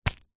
food egg hard boiled impact catch slight crack of shell; zapsplat